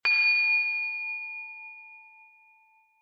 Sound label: tone; organic